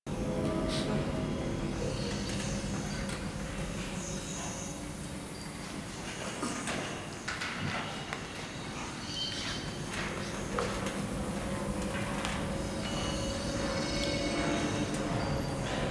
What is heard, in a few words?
Class Room Ambience Loop; Warframe; The New War; Zariman